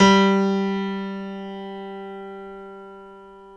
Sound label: 9FT GRAND; OMI - Universe of Sounds